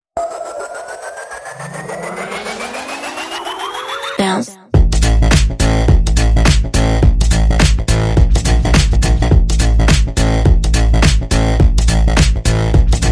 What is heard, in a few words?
No Lead Synth